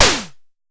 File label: Snare, Pollard Syndrum